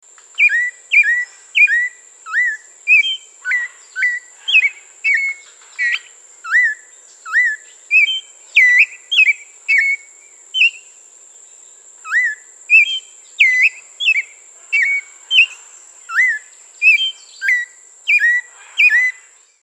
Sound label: Clay-colored Robin